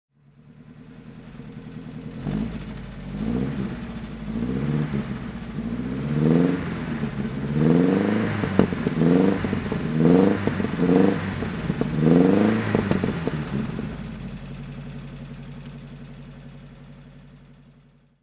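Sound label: lancia augusta throttle, Lancia Augusta Pinin Farina cabriolet, engine sound; Lancia Augusta cabriolet, 1936; V3D/ ClassicarImages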